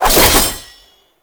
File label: Passive Sickle Spin; Warframe; Dagath